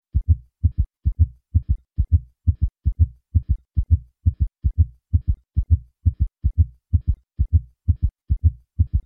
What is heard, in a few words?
Quadruple Rhythm